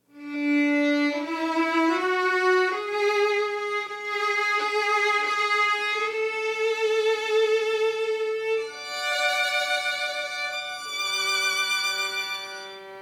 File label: VIOLIN INTRO; Minute of Silence theme